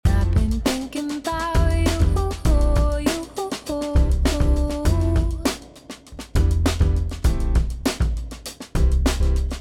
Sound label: loop